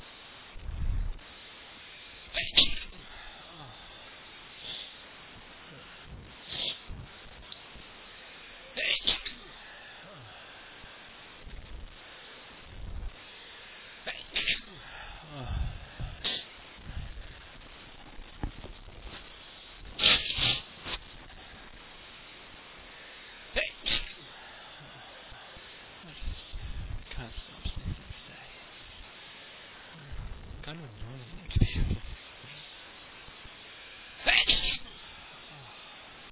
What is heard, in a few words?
for a few sneezes more, male